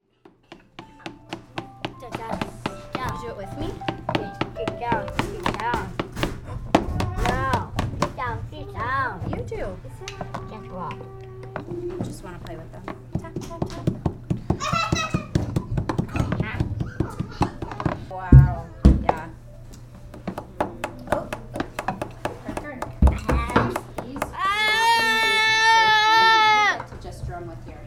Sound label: Big Drum and Vocals